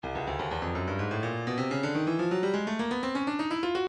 breakbeat 125 bpm; Pound For Pound G; Stylus Breakbeat Midi Files

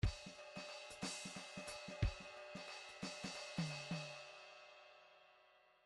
midi file fills 3 4 fill

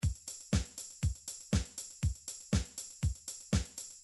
midi drum patterns drums reggae

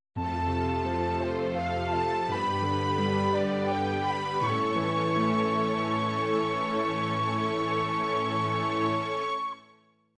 ocarina melody; Nintendo 64 Legend Of Zelda, The New

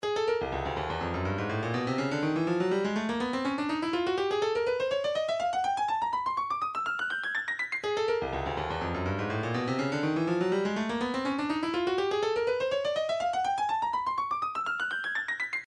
123 fx loop; Thumper

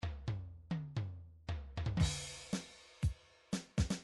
midi drums intro

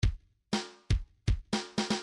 funk kick snare 1 bar

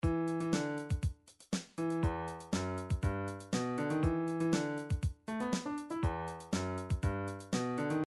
funk, midi

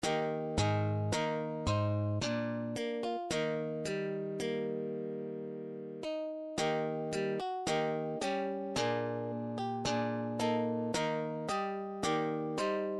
christmas bells, midi

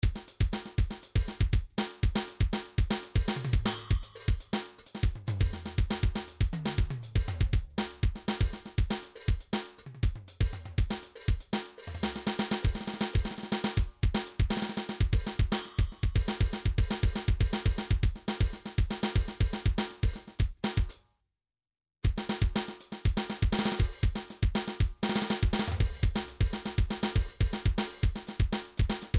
midi vol 4 drums percussion

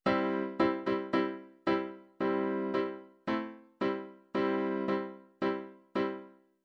chord lines rumba; Midi Files 1000

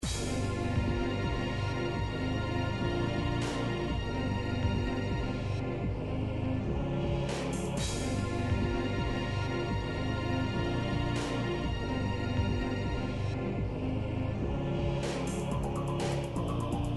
background music; gameboy advance; mortal kombat 5

